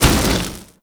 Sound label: Veilbreaker Jetpack Thruster Burst B; Warframe; Vehicles